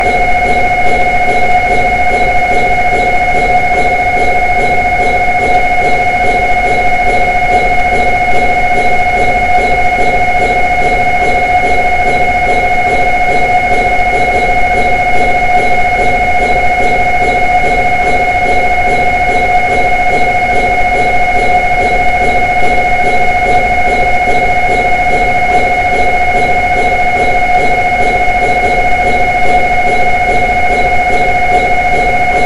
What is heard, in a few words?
Ambient Cryogenic EPI